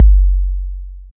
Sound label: 808 Kick